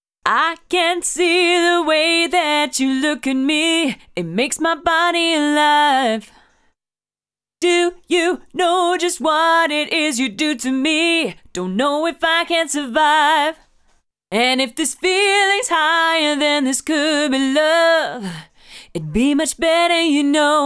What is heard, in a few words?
Vocals; cut up vocals